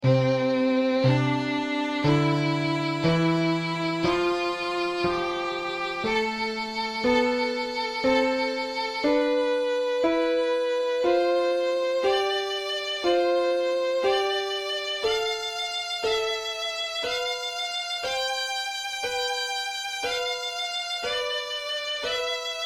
violin piano, Sonificação de fertilizantes por hectare; fertilizer use per hectare of cropland USA violin EU piano